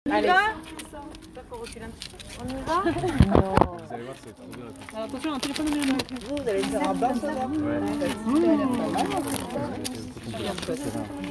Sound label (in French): tutti